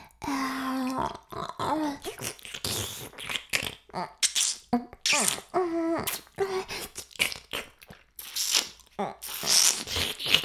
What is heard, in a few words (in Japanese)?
フェラ音声, ASMR; 白くて黒い魔性円環